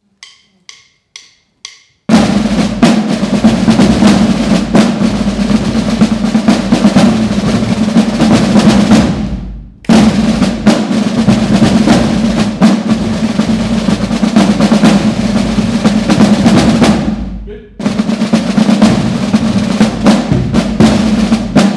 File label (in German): Tambouren